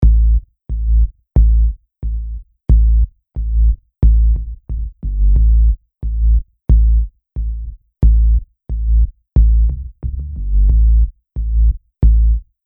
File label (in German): nur bass